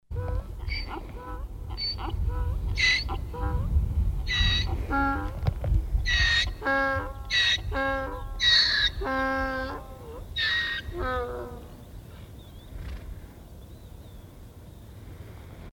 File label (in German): Sound Esel